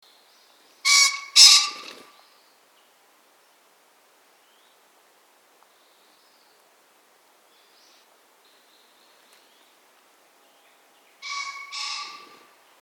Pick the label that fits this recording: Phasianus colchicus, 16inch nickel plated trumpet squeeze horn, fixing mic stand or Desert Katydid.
Phasianus colchicus